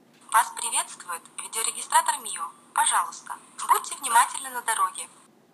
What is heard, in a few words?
startup; mio